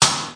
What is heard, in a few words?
gate rim, snare; single hits